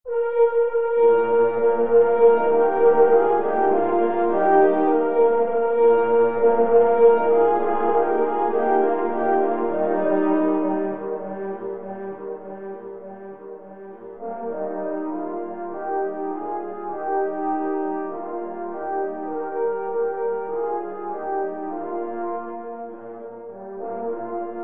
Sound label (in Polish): Lesny marsz na 4 parforsy Es